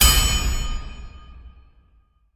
Attack Hammer

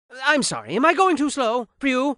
cough; narrator voices; puppetshow BOSS

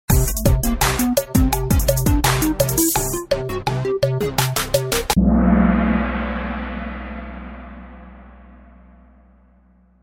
In Game Music (5 Second Count Down); Kahoot

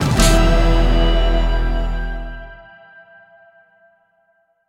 Sting Vs Transition

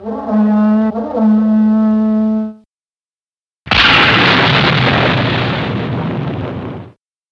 Power On Coin; Metal Black; Dino Rex; Sound Tracks for Digital Generation; Gamemusic Discovery Series

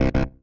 Panel Dressup Wrong; Among Us

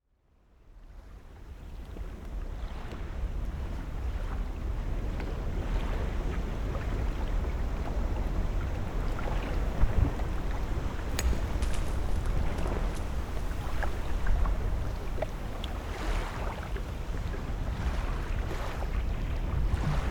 Devil's Spine Ambience (Night)

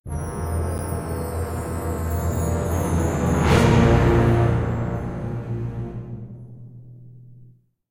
Cabaret Piano

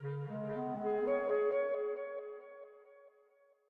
Arp; Proteus

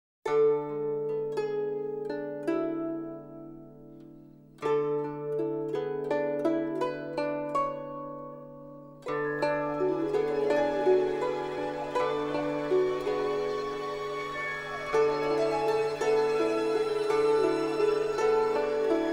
Melody Of The Beautiful Koto; the tale of the princess kaguya

